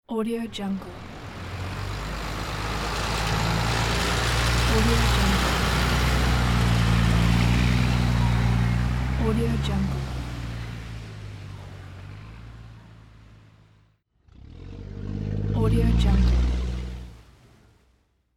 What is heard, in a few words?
motorboat pass by; urban sounds